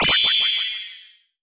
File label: Score Count Waveclear; disney star smash android ios mobile gamerip 2020